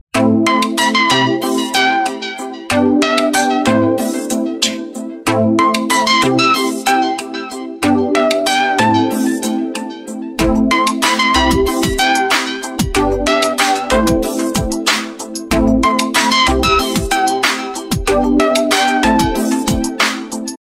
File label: oppo ringtone